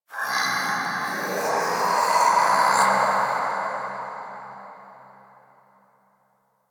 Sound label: Texture Interior Large, Ambience; Sentient Lair; Warframe